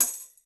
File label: tamb hit